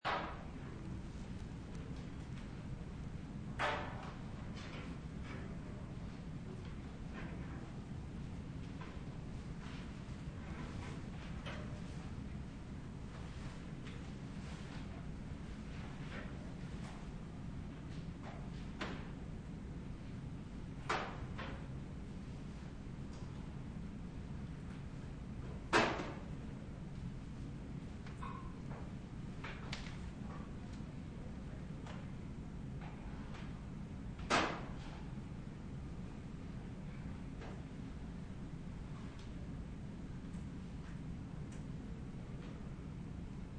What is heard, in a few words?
Amb Library